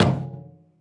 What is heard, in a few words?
footstep armor; Footsteps, Armor